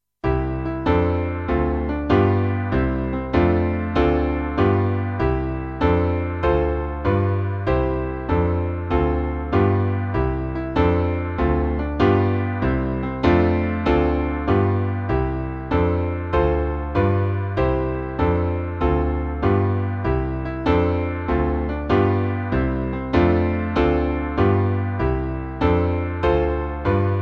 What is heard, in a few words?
Backing